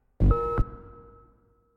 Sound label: Drone